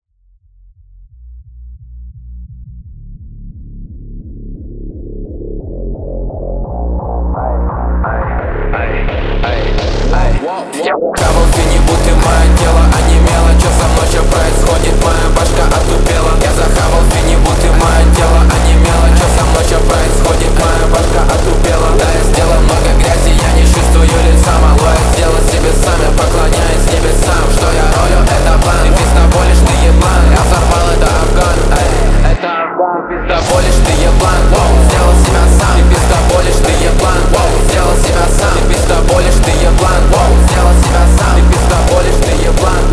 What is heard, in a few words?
hardstyle remix